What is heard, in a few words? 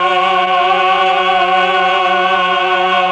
CHOR, CHOR+OBOE; ILIO; Propeller Island Legendary M400